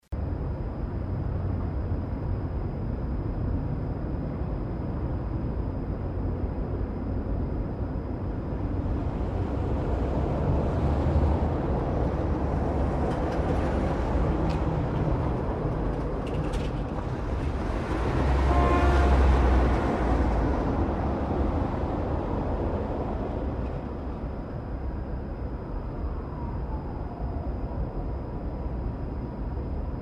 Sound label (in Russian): dve mashiny v nochnom gorode